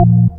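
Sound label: organ, Stabs; E Lab; XTc Files of House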